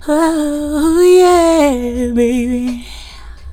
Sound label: FEMALE, Hip Hop; E-MU Formula 4000 Series Vol. 1; Hip Hop Nation; Phatt Phemmes 3